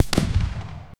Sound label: an ac 130 gunship 40mm bo